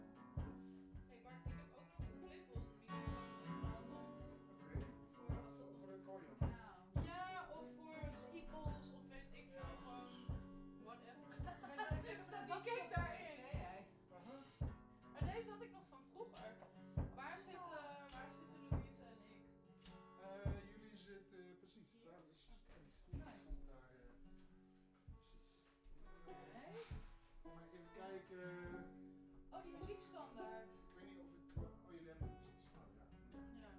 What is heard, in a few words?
Bass Mic